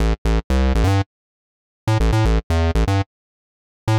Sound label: Synth Bass A; PowerFX - Nu Jazz House